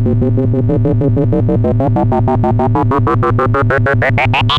Moog C#4, rare synthesizer; Hollywood Edge; Giorgio Moroder Rare Synthesizer Collection; MLTI.MOOG

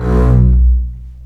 String Collection, MARCATO; East West - The Ultimate String Collection

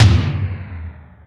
power tom; Greytsounds; Scott Peers Sound Engineering

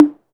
HOUSE DRUM; AKAI S6000 CD-ROM - Volume 1; DRUM RYTHM

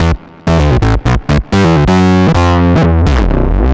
Bass, 128bpm, E2, analogue circuit; musicradar

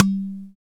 Dumbek; Roland LCDP14 Africa VOL-2; Af.Hand Drm2; N.Afr.Dumbek

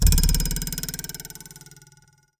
Ruler Twang, cartoon Foley Fx; musicradar; cartoon caper

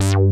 FAT SYN BS; Pro-Rec - Synthsonic; BASSES

